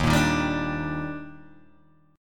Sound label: Eb Major 7th, Guitar, Open G